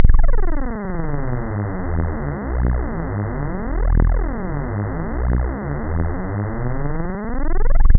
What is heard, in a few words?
risset beats